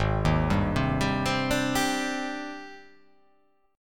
G sharp Major Sharp 11th

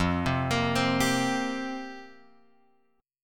F Suspended 2nd Suspended 4th F; Fsus2sus4 Chord {1 3 X 3 1 3} arpeggiated

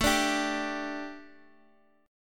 A 7th Suspended 4th A, Guitar DADGAD; chord

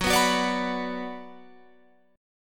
Gb Suspended 4th Sharp 5th, Guitar, Open F; strummed

